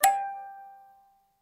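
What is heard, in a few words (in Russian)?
kryshka opuskaetsya zahlop, zavodnye igrushki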